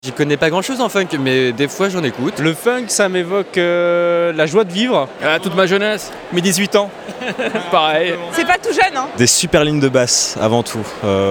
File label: Micro trott funk